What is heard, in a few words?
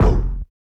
Kick